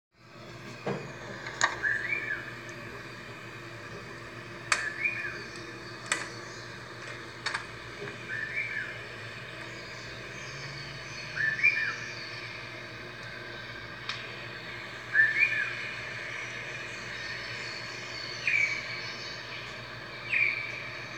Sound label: Red-whiskered Bulbul Voice